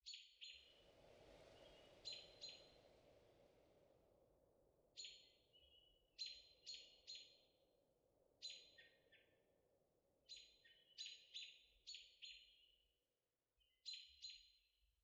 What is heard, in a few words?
day temperate; ambient dayscape